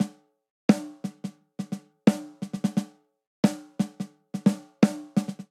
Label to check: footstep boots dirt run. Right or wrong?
Wrong